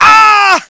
death; svencoop; scshogo; fallenofficer

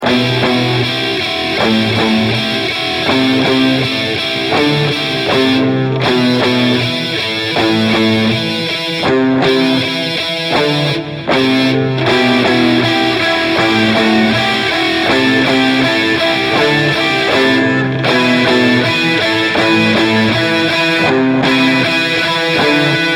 boss hand intro; svencoop; source of life

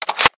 gun reload; svencoop; hl2; alyxgun

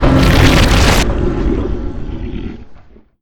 powerup; svencoop; morass beta

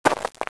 player dirt; svencoop; sence; mistake coop

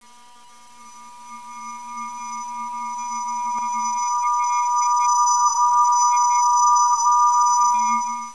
atmosphere; svencoop; sence; mistake coop

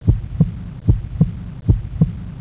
breathe; svencoop; AoMDC

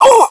pain; svencoop; scshogo; fallentrooper

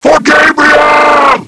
death; svencoop; scshogo; fallenstrooper

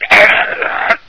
pain; svencoop

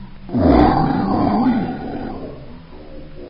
attack growl, spitter; svencoop; custom monsters

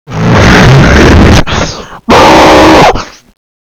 screaming; svencoop; owen

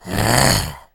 zombie attack; svencoop; justzombies

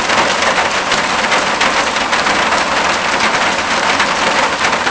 buzzer rain up; svencoop; sence; mistake coop

